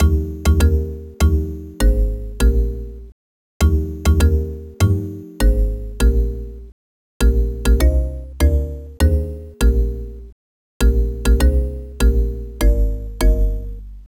Jingle (Happy Birthday？); Zoo Tycoon 2; nintendo ds

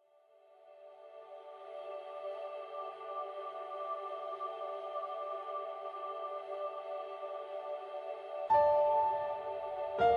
Romance Stinger; mass effect 2

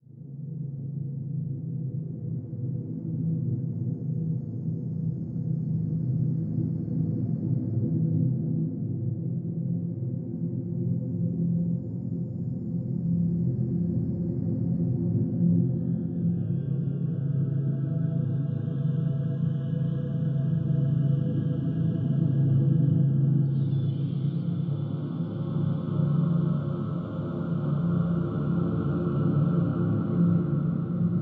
Medallion Pyramid Ambiance; Valley

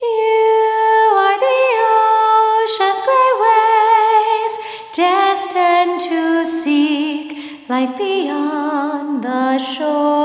Vocals; fire emblem fates if english

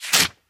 Ticket Rip; angry birds

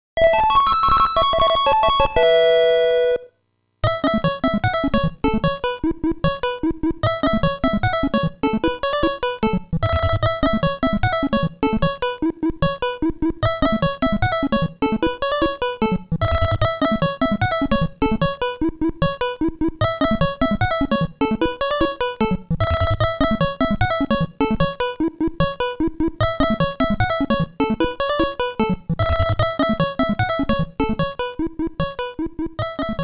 Fanfare ~ Race BGM; Game Sound Museum Namcot; Family Series

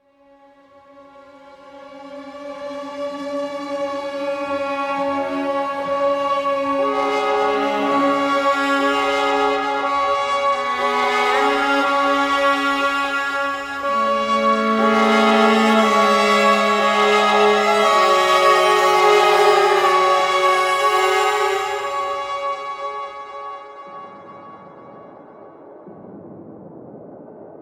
Heroic Action Suspense Oneshot

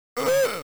boss hurt

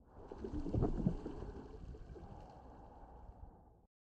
Scuba; lethal company; ambience; windows

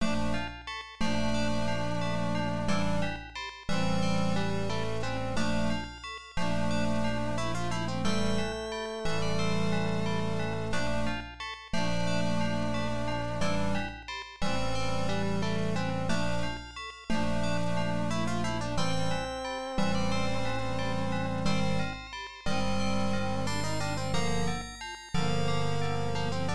Light Room; rusty pc-98

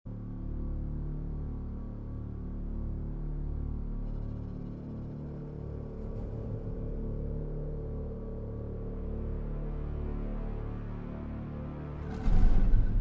Ambient Mission Alternate

